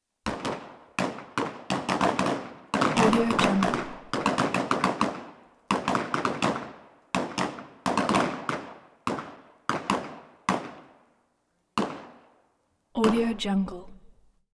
shooting range

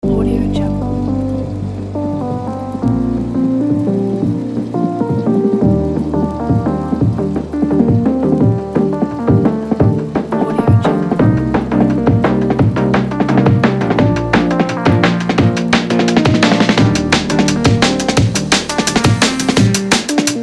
modern dnb

